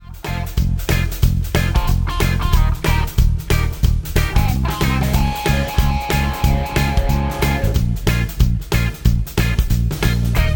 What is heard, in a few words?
Accompaniment